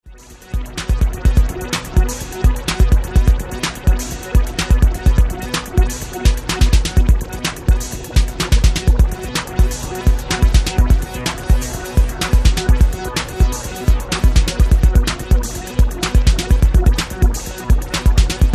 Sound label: TR808 Roland drumcomputer